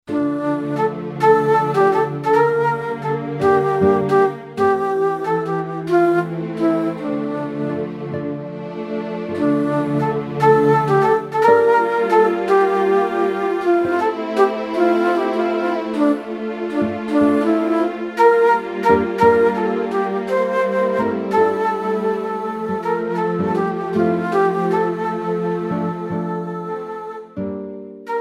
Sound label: Medieval Waltz